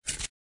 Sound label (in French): papier dechire; missmandarine